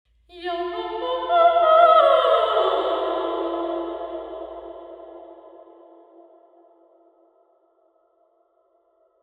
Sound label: sports centre sing; cardioid; university york